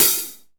airy open hi hat sound f sharp key, Royality free open hi hat sound tuned to the F# note.